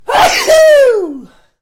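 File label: Monster Sneeze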